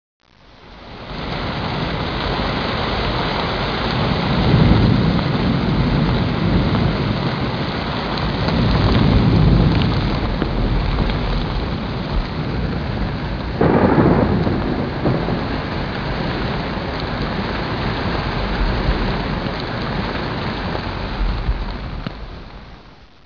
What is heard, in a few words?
thunder